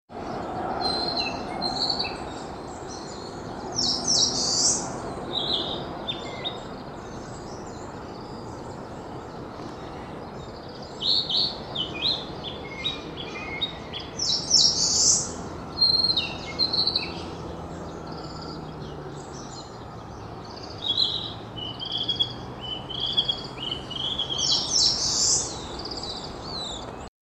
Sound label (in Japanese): kibitaki; kibitaki.sendai